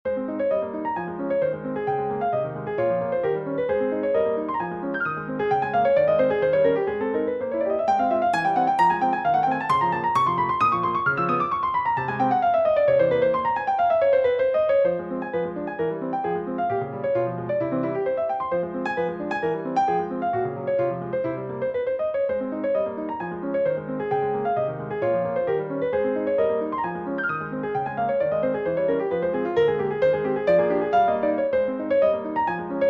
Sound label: Piano; Three Pieces for Piano IV Fugace